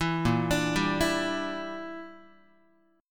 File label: A sharp 6th Flat 5th, Guitar; A#6b5; arpeggiated; 7,6,5,5,8,x